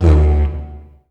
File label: DIGERIDOO; Best Service; World Colours